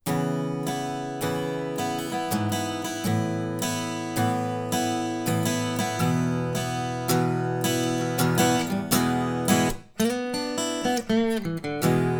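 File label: alt guitar 3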